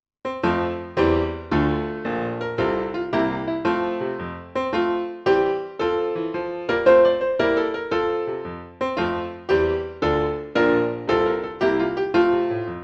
Full Tempo with Solo, Trumpet; Solo Accompaniments; Fitzgerald; English Suite No 5

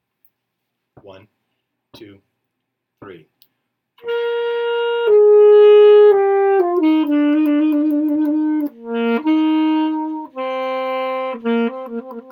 Alto Sax - Saraband; Solo Accompaniments; Leclair

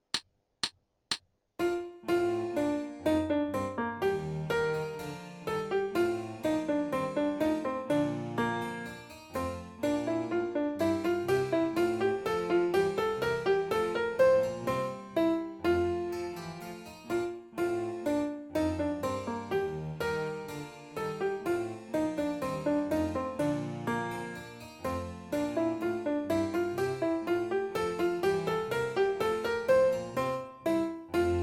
Bouree Slow Tempo with Solo, Alto Sax; Handel-Andante and Bourree

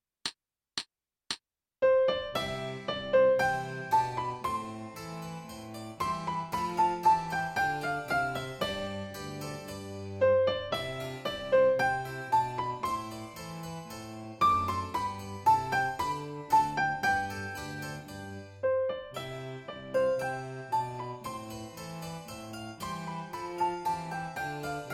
solo accomp, Flute; Solo Accompaniments

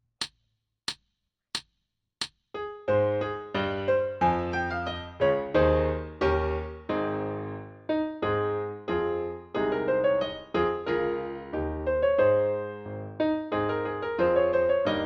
Slow Tempo with Solo, Trumpet; Solo Accompaniments; Fitzgerald; English Suite No 1

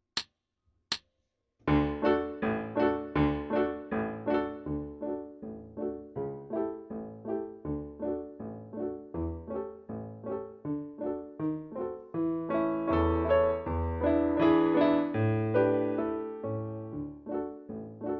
Serenade-Full Tempo Accomp Only, Flute; Herbert

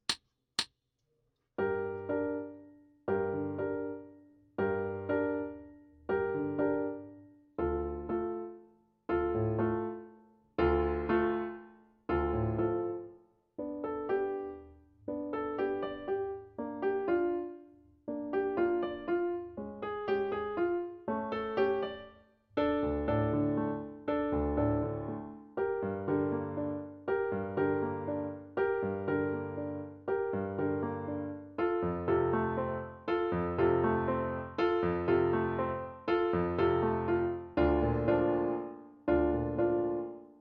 Waltz Full Tempo Accomp Only, Tuba; Kabalevsky; Waltz and Galop

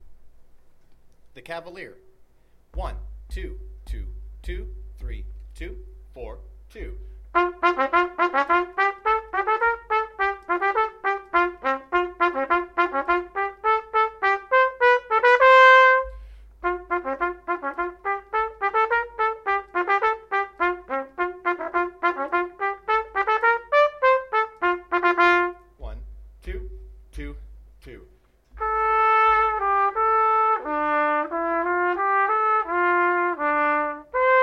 Solo Only Full Tempo, Trumpet; Solo Accompaniments

